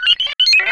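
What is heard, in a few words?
sphere blips large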